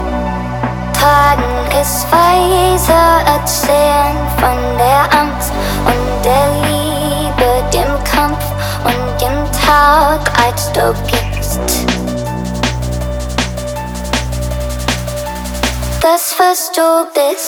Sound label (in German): Techno; Dance